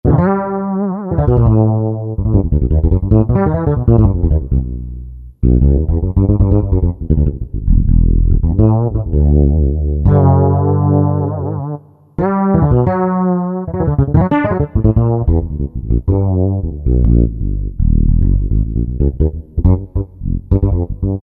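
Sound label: bass Fretless, Yamaha TG500; Preset Voice; BA Frtls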